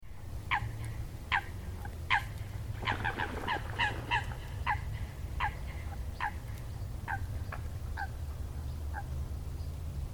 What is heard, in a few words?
Coot